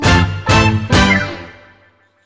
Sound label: Hit